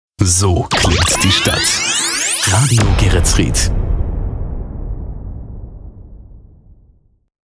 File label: Jingles Shotgun; Radio Geretsried